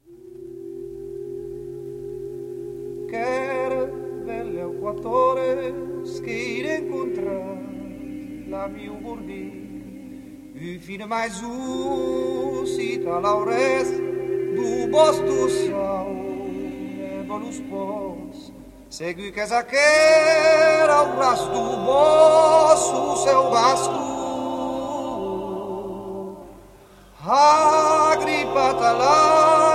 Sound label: canto